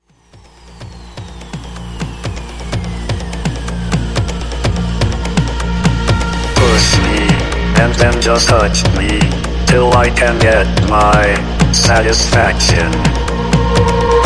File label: Epic Mashup 125 Bpm